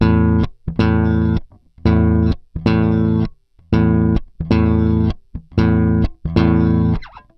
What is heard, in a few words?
Groove Pluck, Bass; Bass X